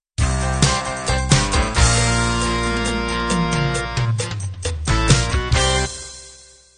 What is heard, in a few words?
rock end